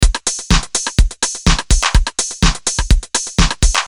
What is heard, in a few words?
Beat Dissected Chicago